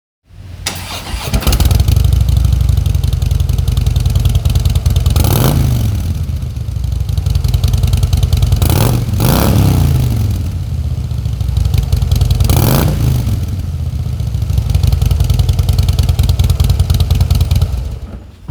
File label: Softail heritage screaming eagle